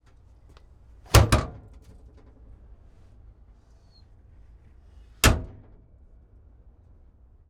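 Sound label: Cromwell Loaders Hatch, tank; 1943; Pole Position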